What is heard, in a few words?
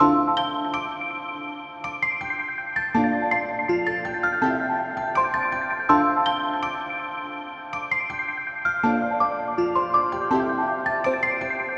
melodic bells